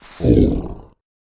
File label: VOICE FX, Dance Vocals